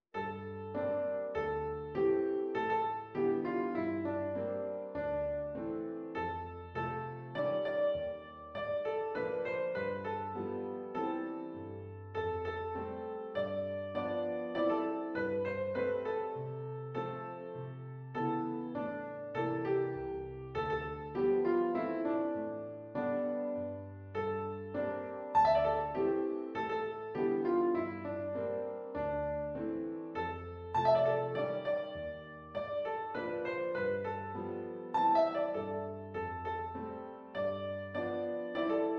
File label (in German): Ungarisches Lied